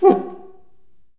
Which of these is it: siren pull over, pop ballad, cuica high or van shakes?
cuica high